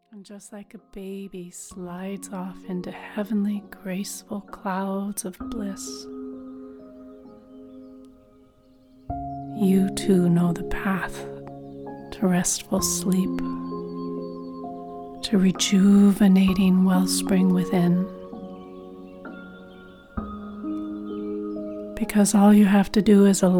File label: lullaby; GROWUP; GOLDENLIFEAUDIO